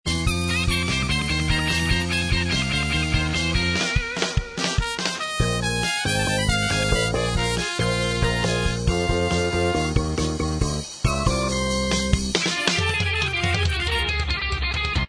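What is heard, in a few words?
instrumental track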